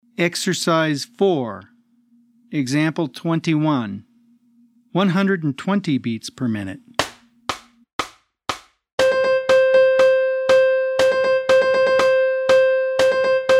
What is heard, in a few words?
120 BPM